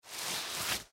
Golf Club Remove, Golf Club Remove Protective Sleeve; SoundEffects-Blastwave; Sports